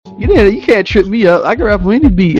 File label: rap on any beat